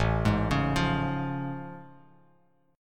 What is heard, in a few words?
A Augmented Major 7th A Bass Standard; arpeggiated; JGuitar